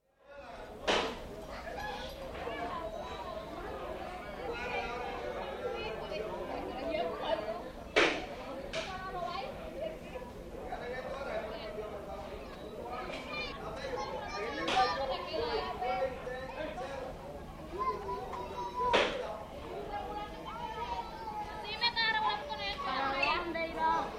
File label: Village atmos Santana (small village near Cartagena) small but busy crowd with noisy children, also wind in trees; Outdoor Sound Effects; BBC